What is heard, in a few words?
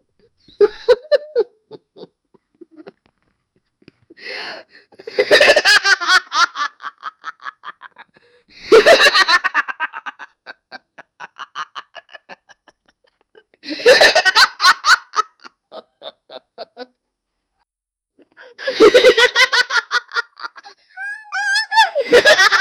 12 year old wolf man, chuckling